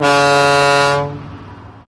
Boat Horn Higher

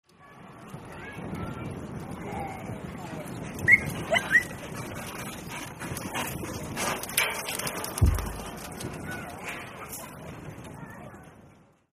Rodeo Cage Ambience, cattle

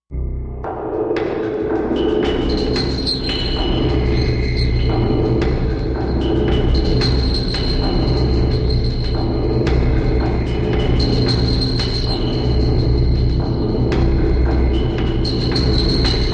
Distressed Machine Mu, musical

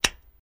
Lighter Lit Click; lighters & matches

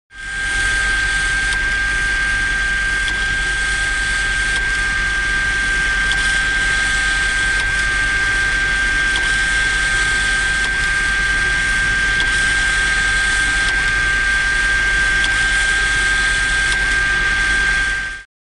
Electric Motor Small

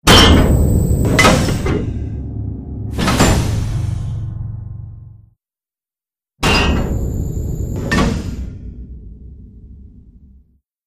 Airlock Huge Open, pneumatic

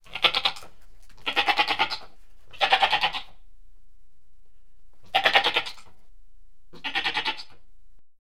Goat Multiple Bleats

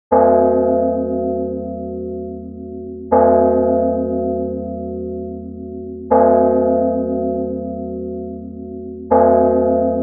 Grandfather Clock St

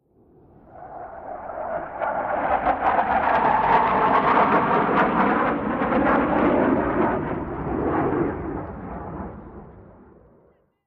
Fighter Jet: Fly-by Distant; fighter jets

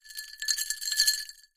ice glass, glass clinks

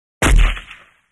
Ak47 Automatic Rifle

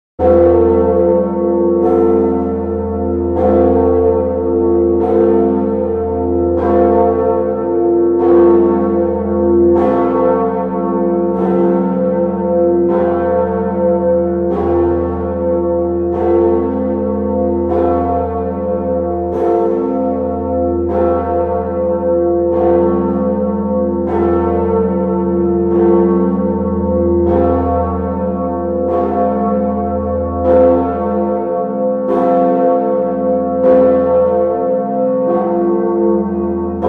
Bell Tower 165 Tonne B; bell towers

